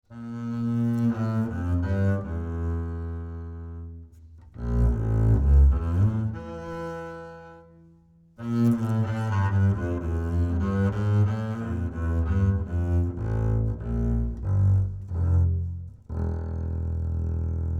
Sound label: Ac Bass 25cm C Bowed Low Mid